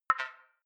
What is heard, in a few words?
click whistle up low, signale